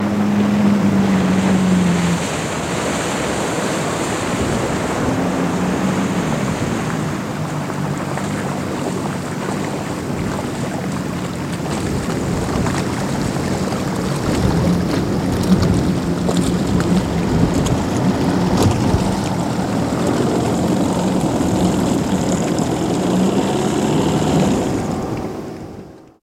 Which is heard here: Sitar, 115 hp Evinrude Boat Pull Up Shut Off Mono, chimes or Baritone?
115 hp Evinrude Boat Pull Up Shut Off Mono